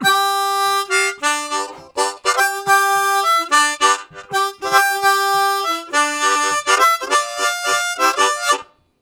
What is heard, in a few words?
Oct Swing, Harmonica; Zero G